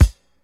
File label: 80s loud kickdrum sound g sharp key, Royality free kickdrum sound tuned to the G# note